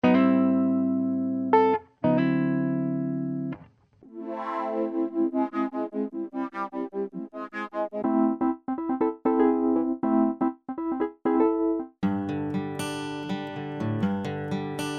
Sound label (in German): Klangbeispiel VCP120